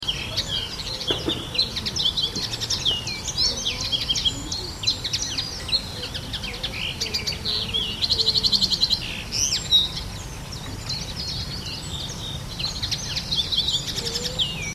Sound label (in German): bluthaenfling, vogelstimmen